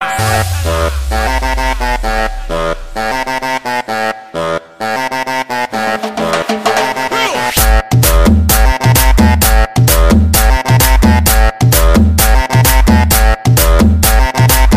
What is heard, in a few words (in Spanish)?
saxo suelta